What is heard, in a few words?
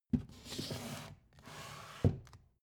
book shelve soft